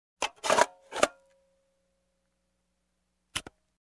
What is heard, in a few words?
Vintage Projector Rewind